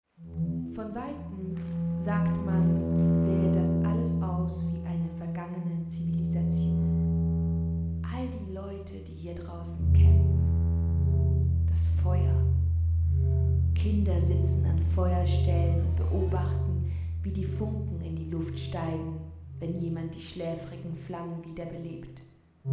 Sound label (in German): Noise Das Rauschen der Menge